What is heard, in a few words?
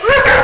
BARK